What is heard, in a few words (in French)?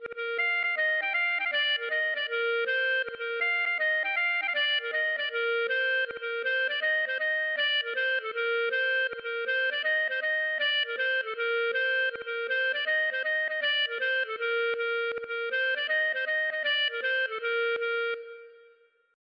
gavotte; Gavotte pourlet No10